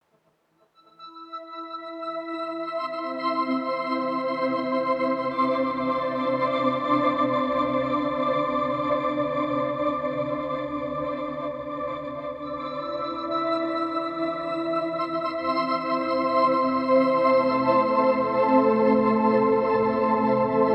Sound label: Granular Octaves